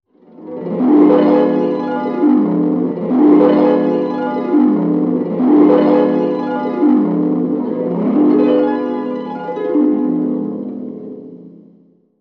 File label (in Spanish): Arpa